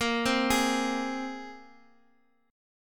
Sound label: A sharp 7th Suspended 2nd down Guitar DADGAD